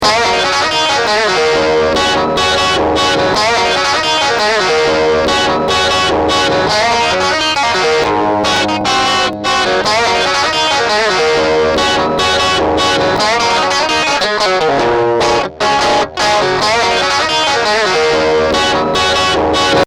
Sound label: scuttle